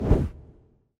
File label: gambler enter; Great Book Of Magic Deluxe; Wazdan